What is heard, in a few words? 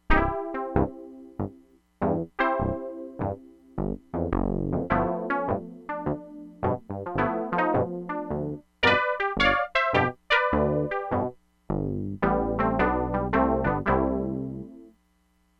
RECTANGULAR SYNTH PIANO, Crumar Bit 99; Factory Programs